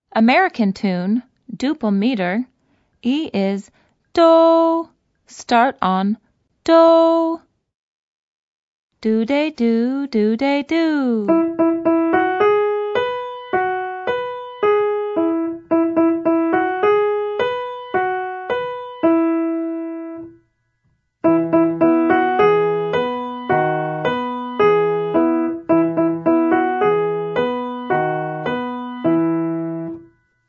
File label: piano